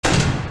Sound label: door slam sound; fnaf